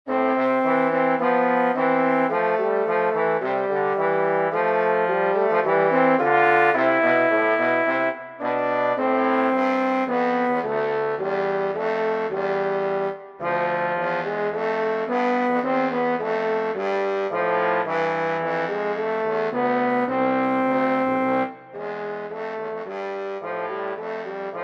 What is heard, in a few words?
Brass for 2 trombone and bas trombone